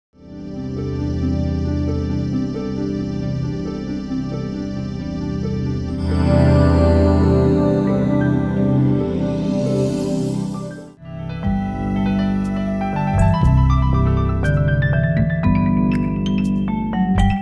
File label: Atmospheres